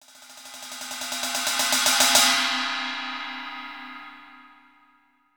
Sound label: ride